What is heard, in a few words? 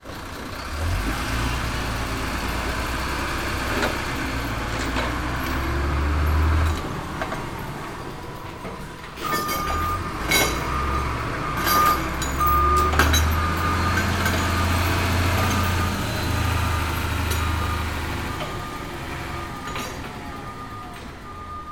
transport truck reverse past loose chains bang rattle